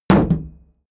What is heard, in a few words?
shield sheet impact dent